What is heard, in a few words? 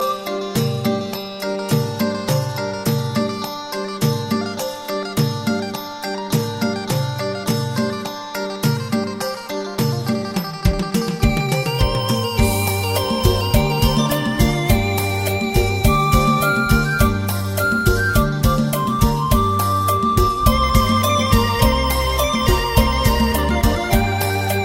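Thai Instrumental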